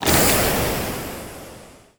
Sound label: toolbox smoke